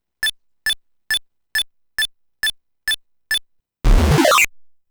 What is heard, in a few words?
Timed Explosion Sound; Jigen Bakudan Taima; Jigen Bakudan Bakuhatsu Saundo; Toypop